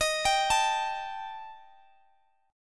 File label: Eb Major Flat 5th, Guitar Open D